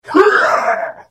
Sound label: Hit; The Elder Scrolls III - Morrowind